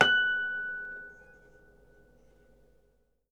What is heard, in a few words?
H F#6, Percussion & Harp; Miroslav Vitous